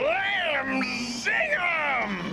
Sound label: Blaam Zing; Warpath